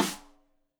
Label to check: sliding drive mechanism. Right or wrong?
Wrong